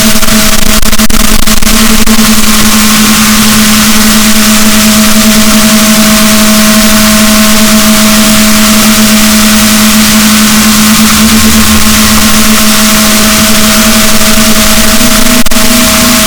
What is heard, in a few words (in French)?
rorquals, boat, Balaenoptera Physalus; SNR 6dB